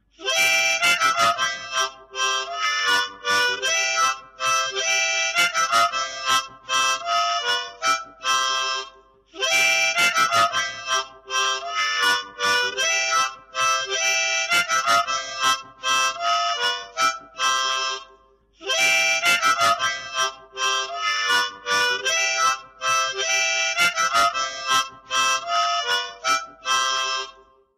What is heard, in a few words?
harmonica